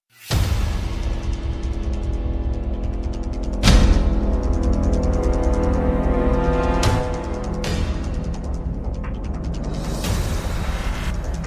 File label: Suspense Theme; The Amazing Spider-Man; PS Vita, PS3, Windows, Xbox 360